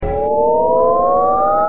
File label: speedup